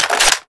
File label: reload clip in, m4; garrysmod; cod4